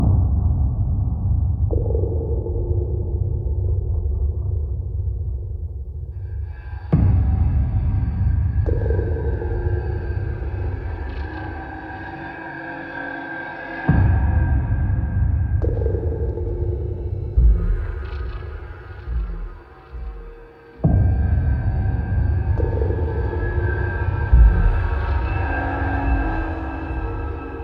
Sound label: Loop 69bpm